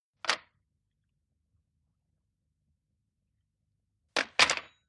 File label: Old Brit Desk Tel Lift